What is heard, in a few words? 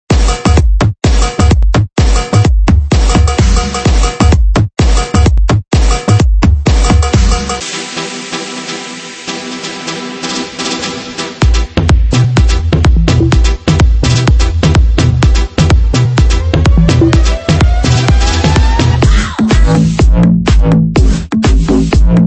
House Groove Tribal; House Groove Tribal Melodic Tech